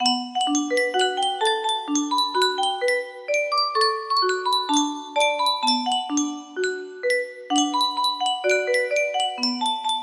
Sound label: music box music box, music box melody